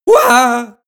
male fearful scream; schreie; menschen; soundpool

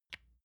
lotion large lid close soft

